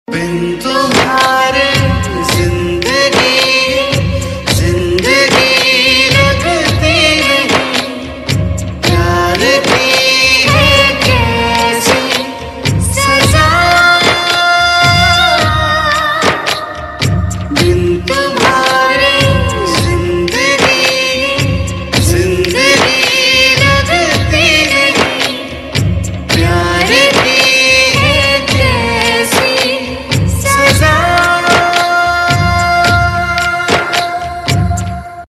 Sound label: Sad Tune; Yeh Rishta Kya Kehlata Hai